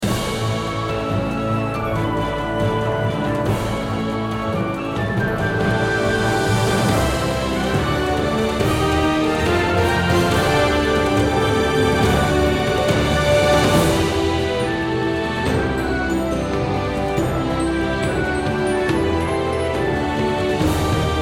BGM background; dragon heroes shooter rpg; android game music

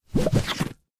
Carousel Transition In; Doodle Jump 2; Android

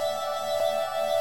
Ambient Magic

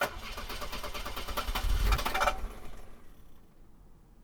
Car Stall; adams venture origins

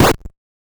Tidal Roll; Gloom

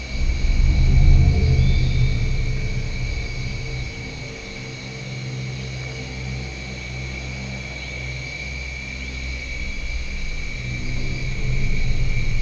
ambience - Nest; fate 2 undiscovered realms; Druantia

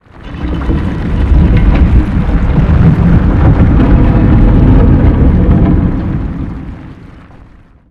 Sfx Colossus Movement

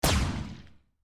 Cross Bomb Destroying; My Cute Commissar

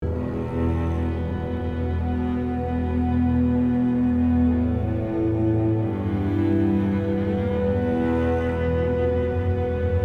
Sad (Loop)

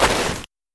Footsteps Mountain boots Grass Mono; Backrooms 2D

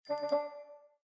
Landscape Feed Discovered, windows 7

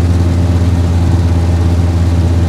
Dodge Challenger Low

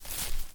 Footstep grass

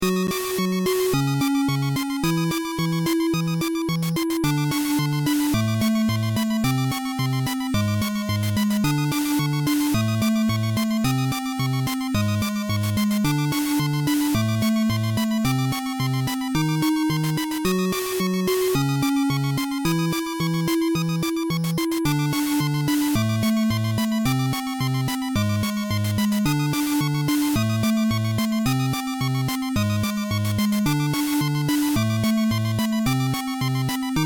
Desert Cave; dragon fantasy book i the volumes of westeria

